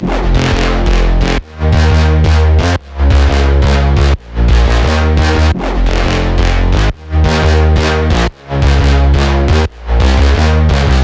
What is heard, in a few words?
full bass; drum and bass